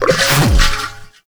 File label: Oil Grenade Throw; Vehicles; Abilities; Warframe; Nechro Mech